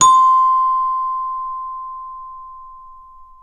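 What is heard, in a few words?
CELESTA D2, Synclavier Percussion; ILIO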